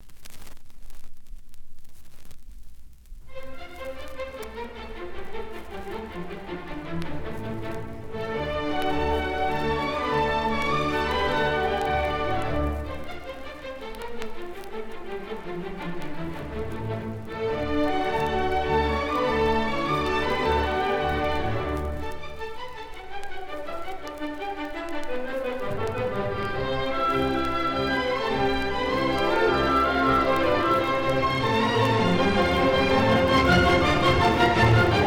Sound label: Allegro; Third Movement; Jolly Gathering Of Country Folk